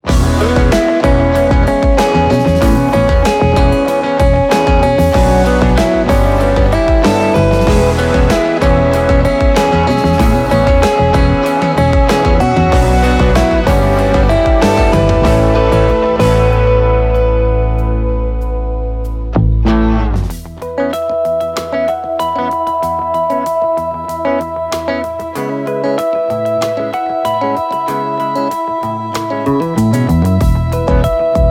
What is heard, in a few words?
cinematic atmospheric guitar music